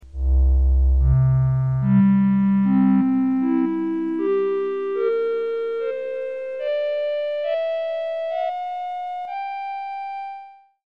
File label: harmonic series on C